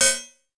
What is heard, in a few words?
teeter; circuslinux